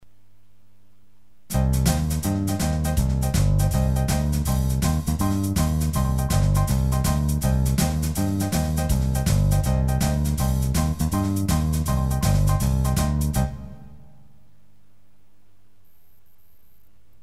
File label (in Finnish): jive boogie nopea